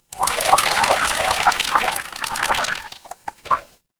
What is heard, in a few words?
water swirl